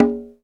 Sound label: CONGA HI; RAW PERC; Zero-G; Funky Elements